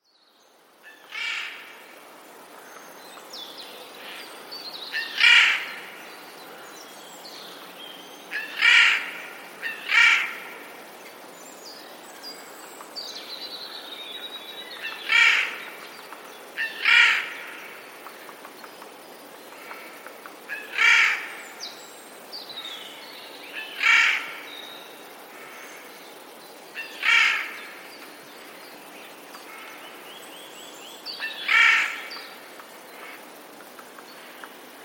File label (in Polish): sojka; sójka kracze, a każde kraknięcie poprzedza krótkim cichym piskiem